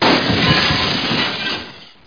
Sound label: Glass break; HereticII Demo